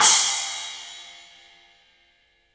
CYMBALS; 3D Sound; 3D Drums

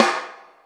SN, drum machines

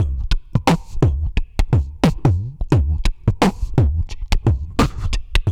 VOCDRUM, MOUTH; MOUTH PC

